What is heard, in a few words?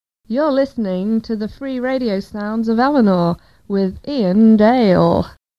Jingles